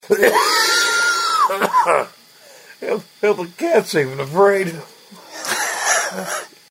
scared cat

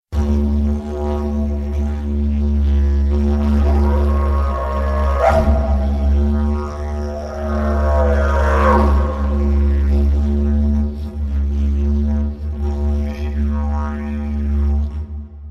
DIDGERIDOO

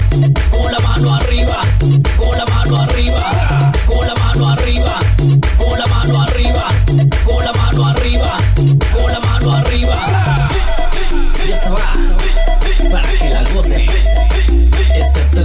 tribal mix, ringtones latino

